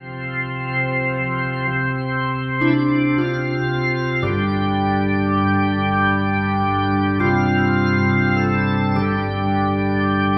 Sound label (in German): Hippie Beatnix Orgel Ueberleitung